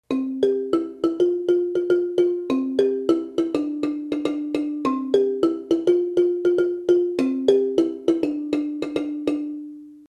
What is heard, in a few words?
low line, marimba